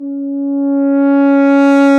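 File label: brass, F.Horn Solo; Roland L-CDX-03 Disk 2; F.Horn FX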